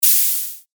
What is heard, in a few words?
Noise, percussion; revealed percussion vol 3